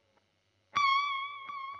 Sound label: GTR Royer